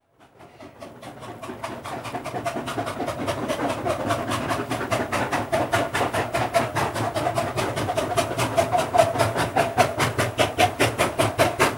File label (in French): locomotives a vapeur; sonotheque